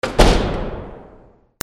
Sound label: DOORS